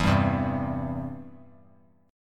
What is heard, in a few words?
E Minor Sharp 5th, chord; Em#5 strummed; JGuitar